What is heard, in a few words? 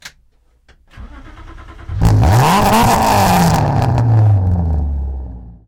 Start; S Toledo 2006